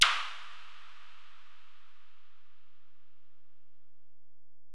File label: EPerc, Alesis DM-PRO; 300 Drum Machines